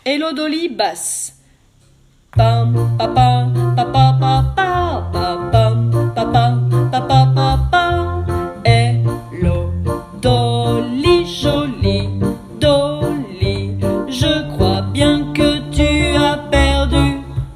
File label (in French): Basse